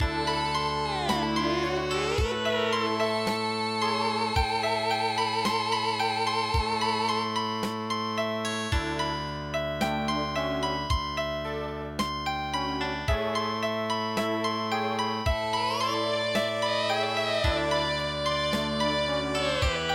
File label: Erhu Music